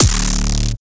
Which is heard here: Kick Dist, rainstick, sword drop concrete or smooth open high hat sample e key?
Kick Dist